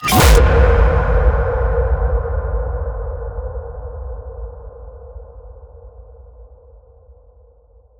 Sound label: impact tight; Virtual Riot; Impacts